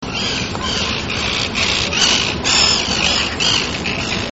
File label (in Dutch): visdief; vogelsflevopark